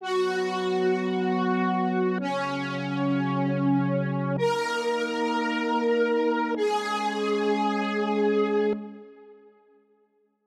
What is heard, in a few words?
8bit